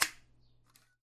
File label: snip; easter